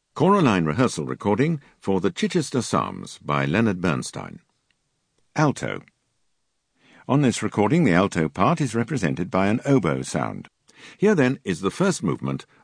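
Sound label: Alt, alto; Bernstein Chich Psalms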